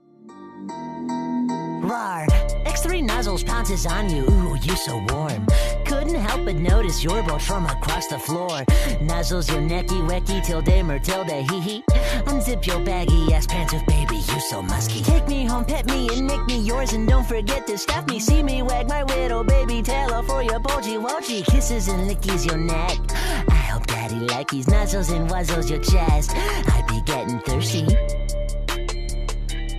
hurt pain

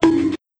bonk

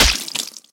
bat hit flesh

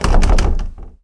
locked door sound

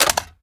ax50 clip hit, weapons; albanyknight

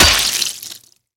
axe hit flesh

